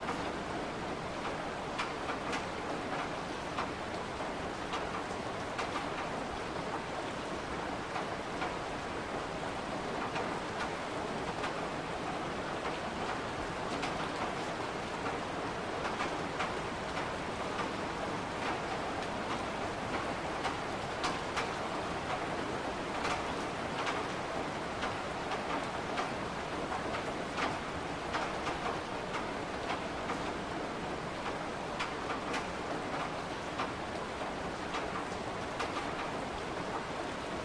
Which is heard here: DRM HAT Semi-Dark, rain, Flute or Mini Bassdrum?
rain